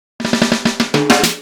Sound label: Wild Fill, Drums; Fills; Misc Fills